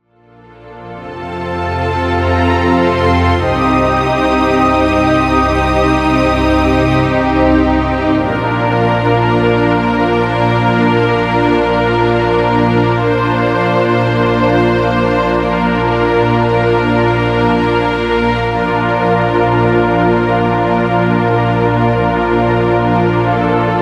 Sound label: Strings Bed